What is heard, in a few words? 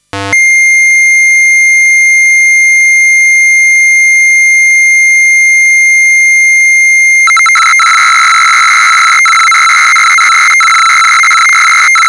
jp8 sounds